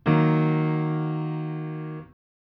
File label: D sm lower, chords